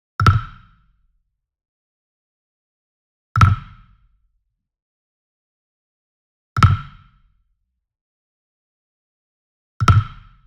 Wood Percussion Wood Block Standard Resonant Flam Near; boom library; Cinematic Strikes Construction Kit